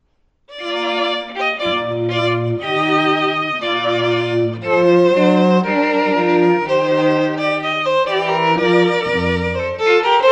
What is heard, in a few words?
chorale; brahms; St. Anthony's Chorale, Brahms